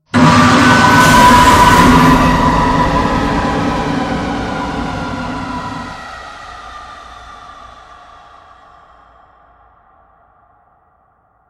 jumpscare sound; the pinagsamas

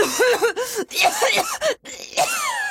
coughing

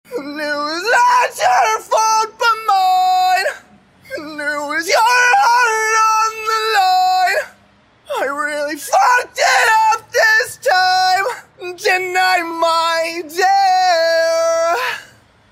emo singing

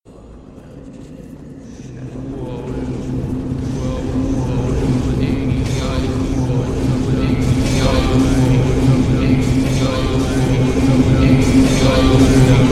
throat sangin

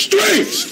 strings

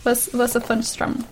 strum

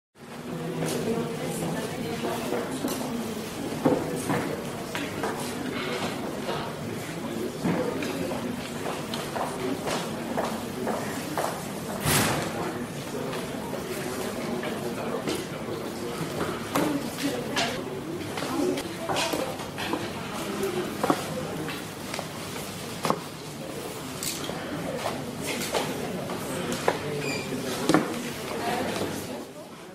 school sounds

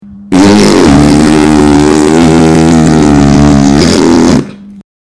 big bombtastic fart

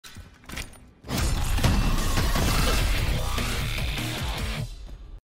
rank up sound; Call of Duty Modern Warfare beta

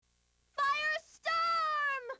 fire storm

